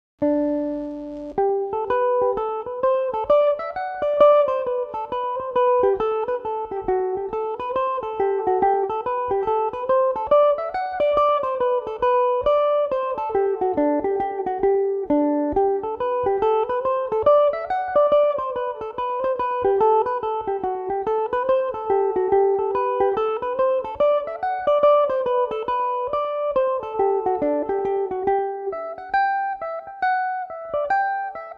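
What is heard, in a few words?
G major, irish mandolin; may day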